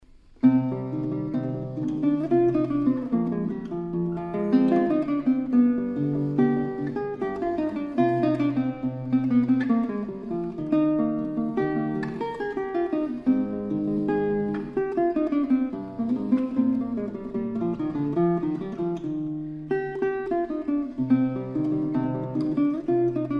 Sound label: guitar; Sonatas Ms84; Sonata No.33 In C Minor; Minuetto; Paganini